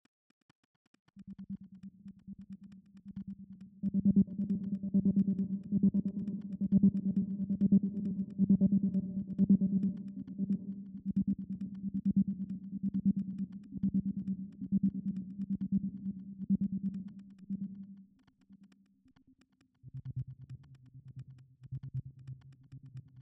pulse, dark sci-fi; dark sci-fi audio pack

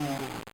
enemy death, bitcrushed voice one shots

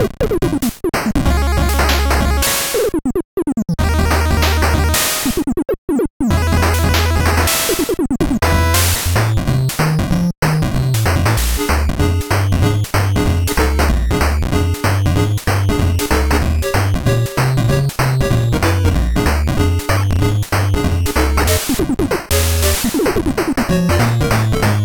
swing

